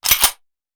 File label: Hand Gun Foley; Sound Ideas; Guns